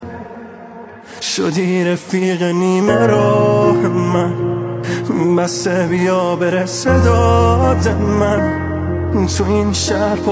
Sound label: Piano; ورژن پیانو